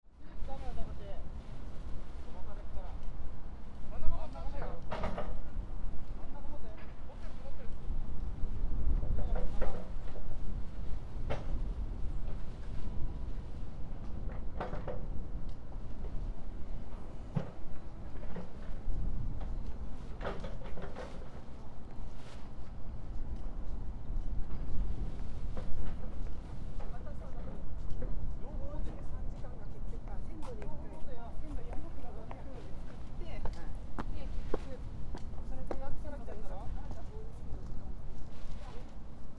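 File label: fu gate, Fukushima University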